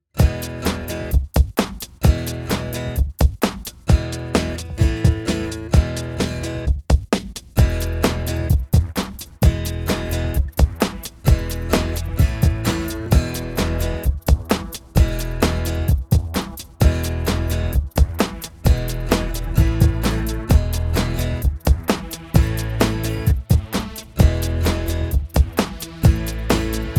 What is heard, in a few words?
NUMBERS instrumental